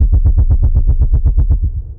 Machine Whirr Bass; Instruments; Brooding Bass; Ableton; Mood Reel

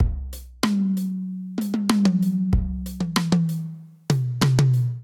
Rockhaven Kit groove 95 bpm, Drum Essentials; MIDI Clips; Ableton

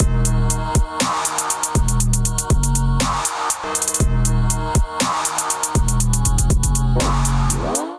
groove 120bpm; MIDI Clips; Deep Knight; Ableton Factory Packs; Beat Tools

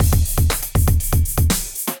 Acoustified Kit, Drums

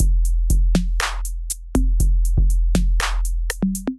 808 Kit; Drum Machines; Ableton; Factory Packs; Drum Essentials

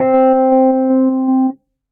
Suitcase, Suitcase Piano; Electric Keyboards; Suitcase Blender; Ableton; Factory Packs

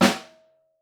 SN Room, Snare

